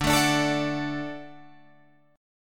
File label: D Major D down Guitar Drop D